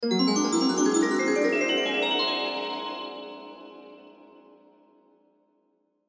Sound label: Twinkle Ascend, Musical Ascend Digital Beeps Delay Low; Miscellaneous Musical; Blastwave